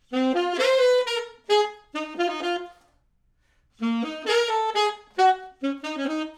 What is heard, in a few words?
saxophones